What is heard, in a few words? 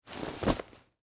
Winter Coat Adjust, Clothes, Movement; Foley; Dressing; BlastWave